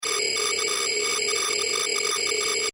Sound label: Science Fiction Computer Process Beeps Short; Computer Sound Effects; SFX Bible 6